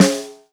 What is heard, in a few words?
Snare